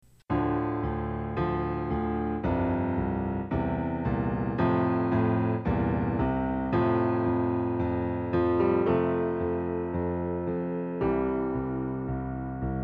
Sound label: music bass accompaniment